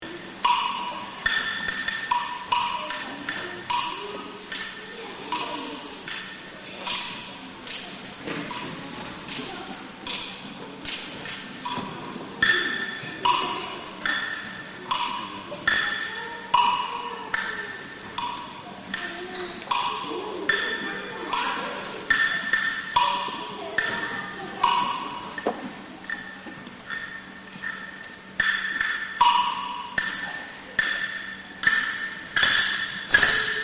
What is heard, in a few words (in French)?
rythmique